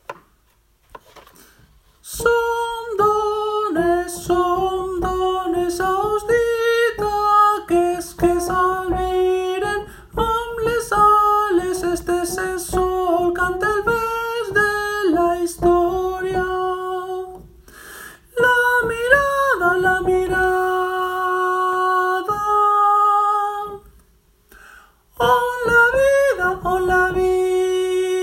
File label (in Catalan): Cançó de mar ALTOS